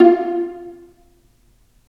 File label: Vas Pizz; STR Vlas Pizz